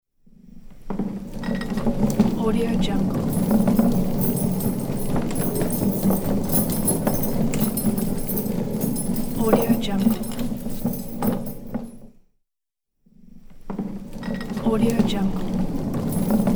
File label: rolling mop bucket across tile floor